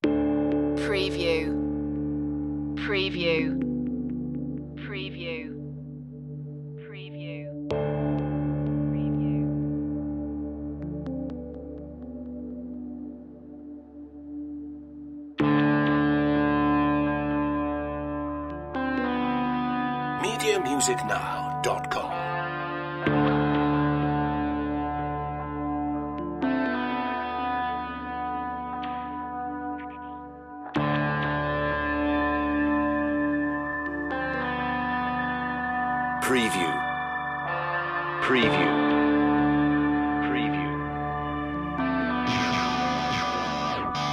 no bass drums

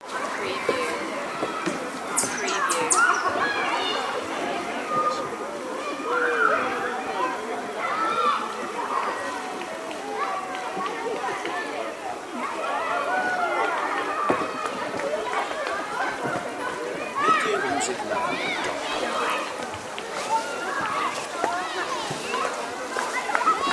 Lake Summer Camp

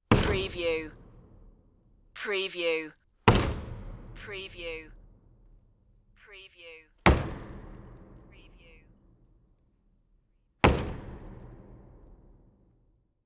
bright destruction hits

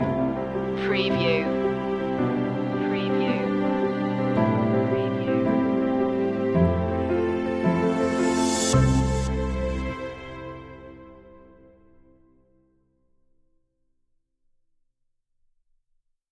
river of reflections stinger

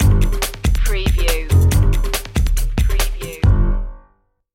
india flower festival stinger

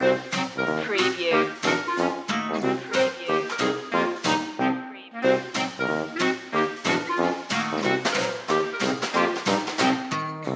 dewdropper loop

